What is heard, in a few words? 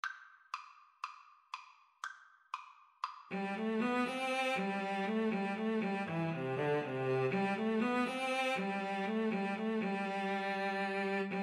Cello Duet; baltimore belle